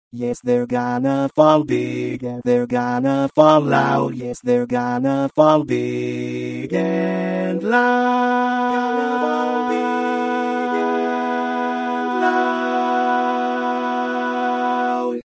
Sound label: bass